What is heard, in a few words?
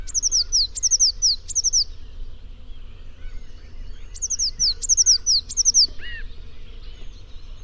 blue chickadee song